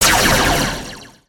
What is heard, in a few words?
Double Blaster fire; weapons; aronwk collection; ndaudio